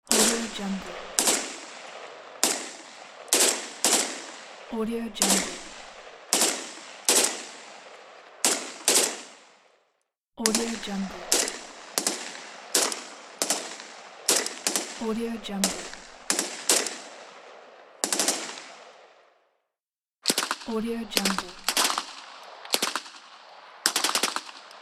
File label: machine gun